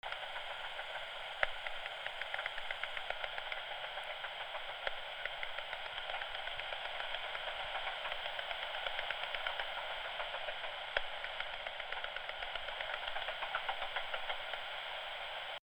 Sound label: Barbitistes constrictus, Thayatal